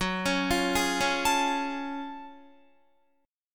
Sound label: F sharp Minor Major 9th F sharp Guitar; arpeggiated